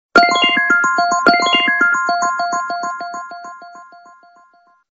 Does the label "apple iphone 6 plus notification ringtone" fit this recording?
Yes